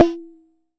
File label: Jomox MBrane, drum machines; Jomox MBrane 11